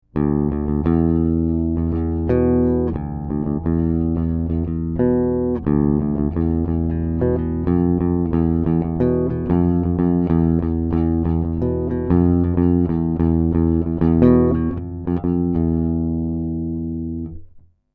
Bass FET

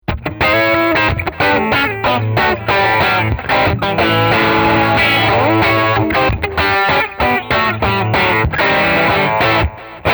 Crunch

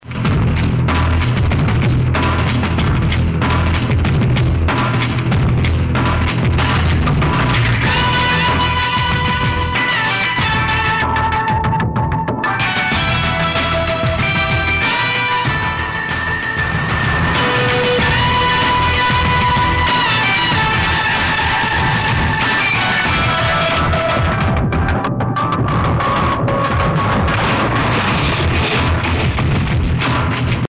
Chaos Moog

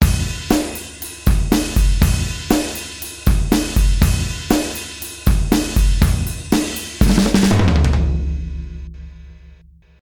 Drums Multiband

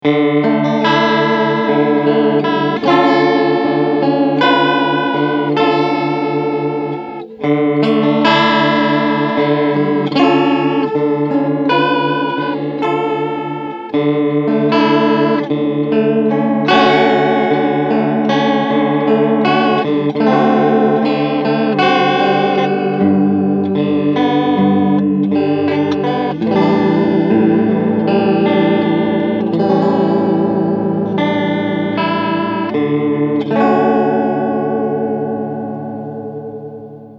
Fast Rate Chorus

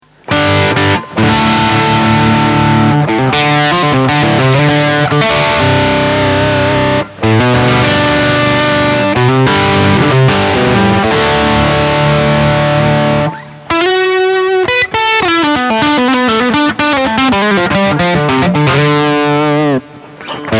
Friedman JJ Junior full gain; Recording Out

